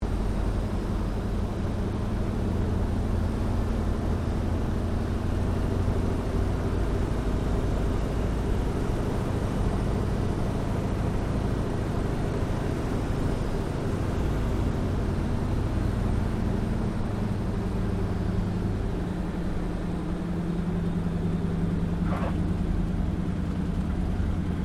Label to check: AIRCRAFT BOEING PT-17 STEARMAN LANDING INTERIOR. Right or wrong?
Right